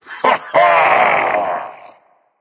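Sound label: nonverbal heh heh; smite; voicepack; merlin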